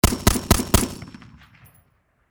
Warfare Machine Gun Springfield M 14 Four Shots Close Perspective; SFX Bible 6